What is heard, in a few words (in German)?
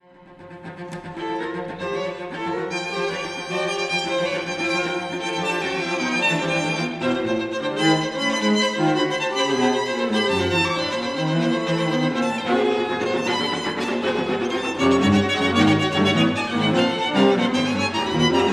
streichquartett